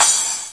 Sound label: 909 Crash; DRUM MACHINES; DRUMS (FULL KITS); 909 Extended